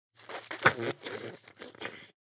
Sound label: glue slurp